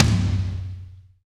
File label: DRY TOM 1 L; TOMS